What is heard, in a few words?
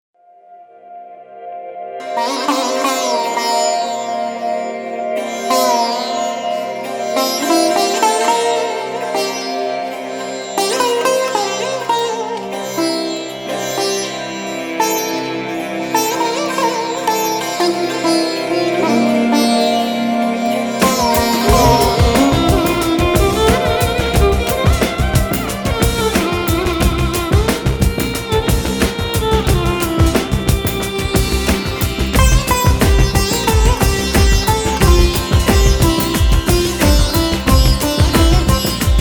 Instrumental